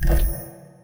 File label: rollover down; ui; dota